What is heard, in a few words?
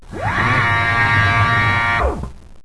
spinner; hydrophobia